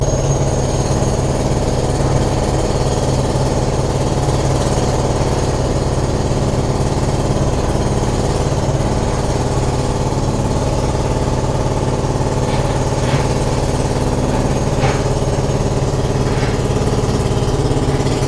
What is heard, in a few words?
breaking concrete